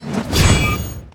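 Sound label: attack katana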